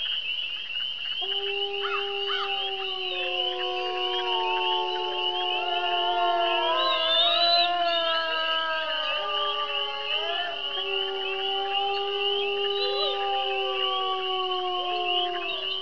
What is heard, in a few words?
wolf howl